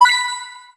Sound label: hint coin